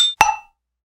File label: Cricket Bottle; Modern Android